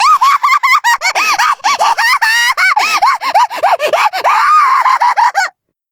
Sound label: mad laugh; nemu